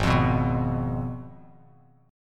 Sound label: G sharp Minor 6th G sharp down Bass Standard; G#m6 strummed; JGuitar